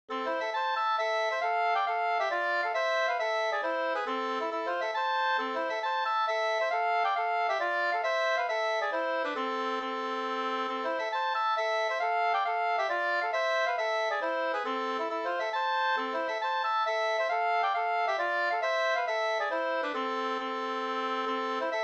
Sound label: mazurka; Uuoma Pertis e Kangas spelman fi 2st